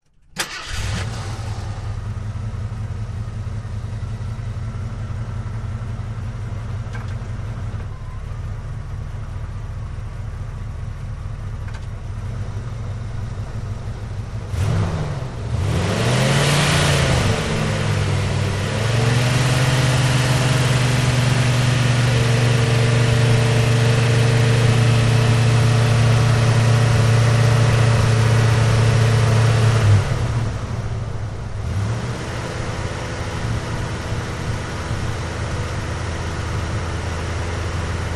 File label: Wagoneer: Start, Idle, Long Rev And Off, Jeep Wagoneer